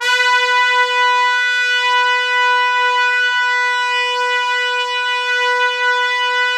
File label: Orchestral Brass; Best Service ProSamples vol.20; AKAI